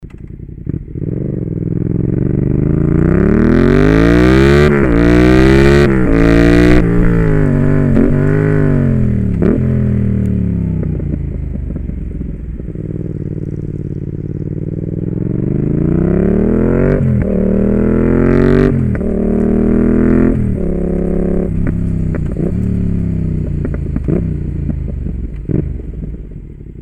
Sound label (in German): Yamaha MT 07 NXT ohne DBK; REMUS